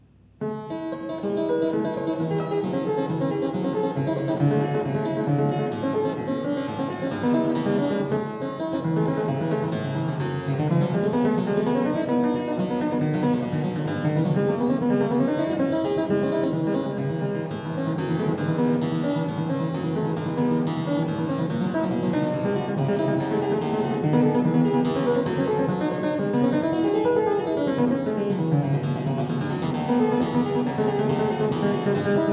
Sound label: Prelude B Flat Major; Prelude No. 21; BWV866; Bach - WTC - Tilney